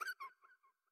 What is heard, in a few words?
Bat Aggro; Going Under